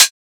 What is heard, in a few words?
Closed Hihat, Techno; Drums; Drum One-Shots; Ghosthack; Free Techno Drum Shots 2024